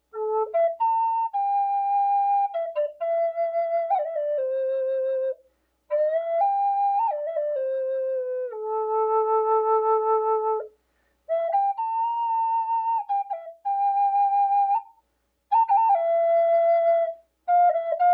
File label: medium A curly cherry; Flutes